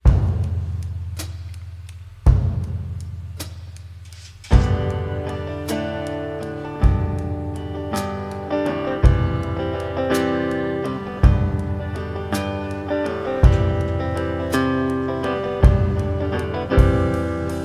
instrumental

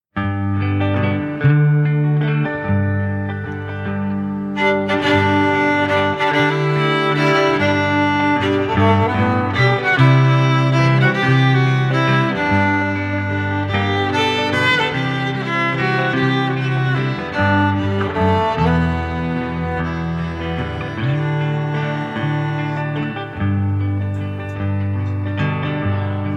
instrumental

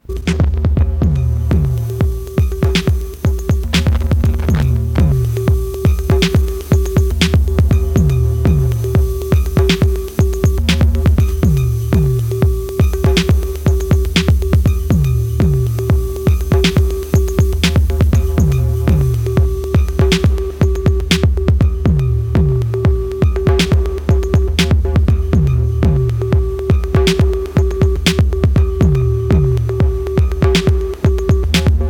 Techno Thing

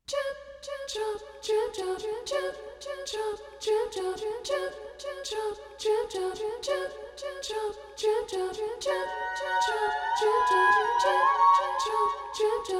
Rain instrumental

